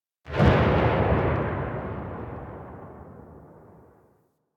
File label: Sniper Rifle Alt Tail; Warframe; Weapons; Corpus